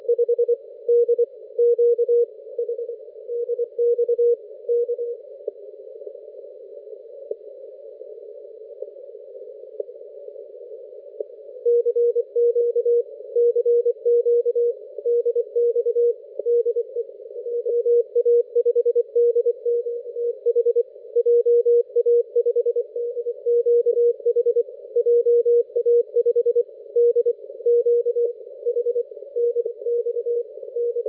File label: CW 1,8MHz; JA5DQH de SP7IVO